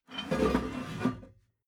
metal scrape wood soft

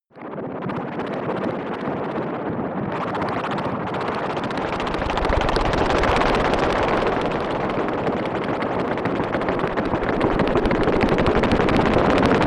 atmosphere helicopter; atmosphaeren

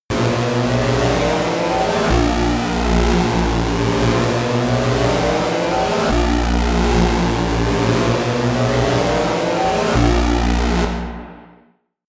detuned destroyer, horror

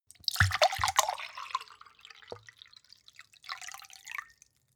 glass pour bottle hard; berufe; gastronomie; soundpool

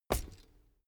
bicycle pedal hit

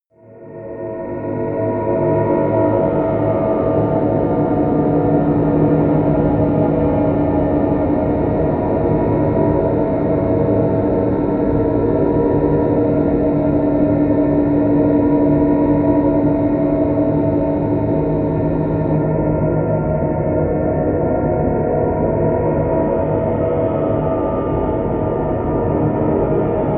drone old electronics

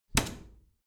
bathroom cabinet door close heavy

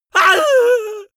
goofy male really afraid scream; soundpool

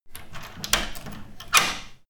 locking wooden door

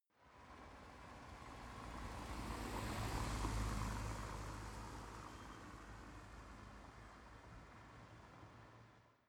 car drive by medium speed

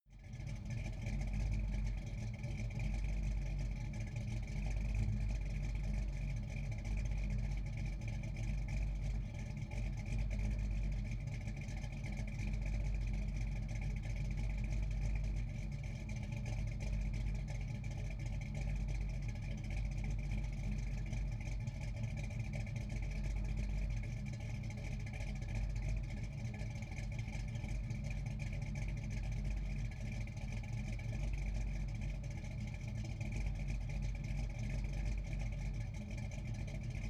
car camaro idle exhaust

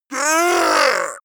goblin scream painful; schreie

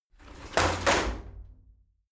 shower slide door open heavy

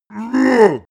male giant attack; menschen; schreie

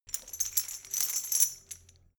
metal chain handle clean

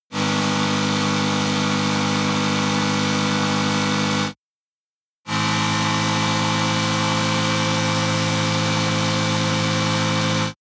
motorcycle engine high constant rpm ascending; motorraeder; verkehr transport

